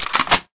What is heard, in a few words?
silencer on, m4a1 silencer; cstrike; csgo